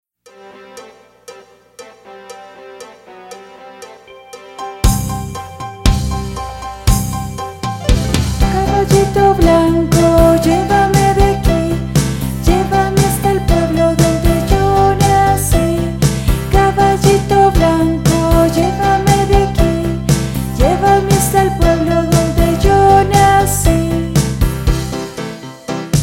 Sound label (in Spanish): guitarra